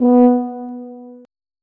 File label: tuba note